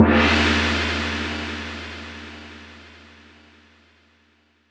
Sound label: Big Gong; Cymbals